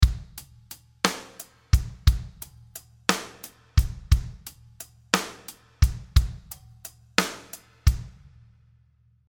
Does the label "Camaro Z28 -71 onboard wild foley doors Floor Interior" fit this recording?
No